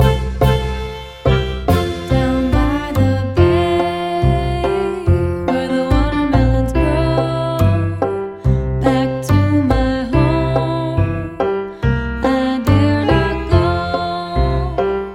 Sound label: Down By The Bay, Player Piano